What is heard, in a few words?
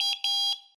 Metal detector; zps; Ambient